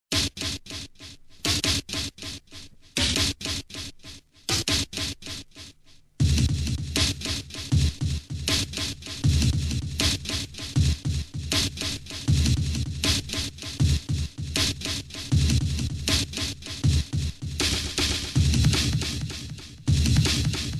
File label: Hardcore; Underground Rave